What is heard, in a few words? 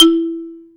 XYLO E 3; AKAI S-Series CD-ROM Sound Library VOL-7